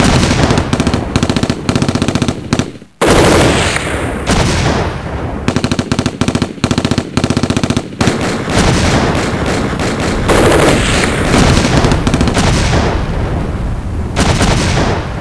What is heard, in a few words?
gun battle; Schuetzenchor